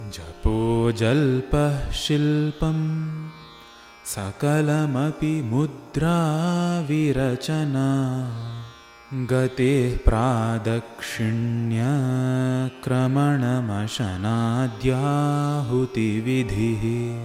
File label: chanted Vers recitation